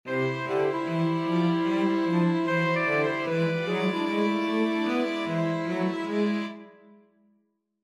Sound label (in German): bewegt Streicher